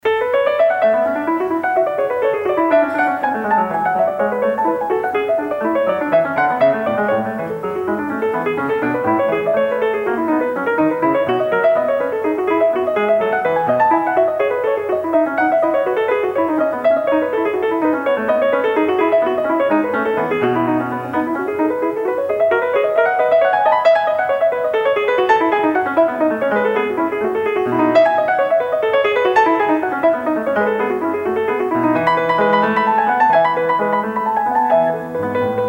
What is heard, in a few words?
Classical Piano Recorded Live